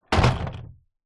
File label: household doors double wooden old close